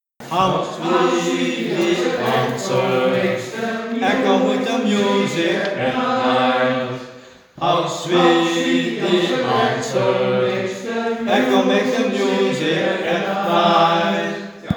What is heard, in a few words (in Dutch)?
Musics Echo Tenor en Bas deel1